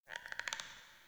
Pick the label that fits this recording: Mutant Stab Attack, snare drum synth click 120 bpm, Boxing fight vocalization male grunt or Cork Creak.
Cork Creak